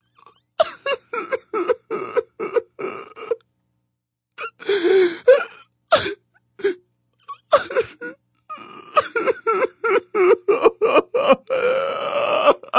Crying Male, Male crying, long sobbing, pain, anguish (human voice)